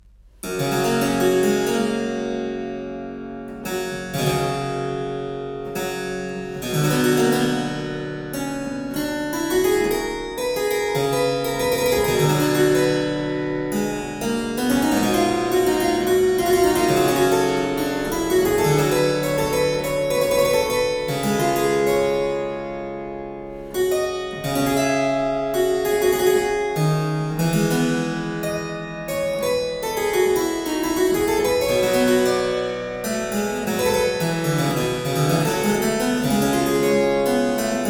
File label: Preludium Italian Harpsichord